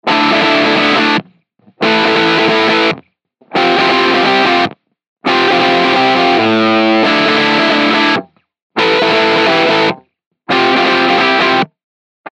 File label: DRIVE; gr5 presets rope 4 in 1